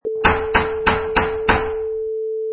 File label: Door Knocker Hits